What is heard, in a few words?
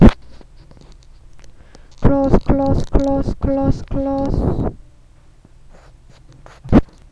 cloth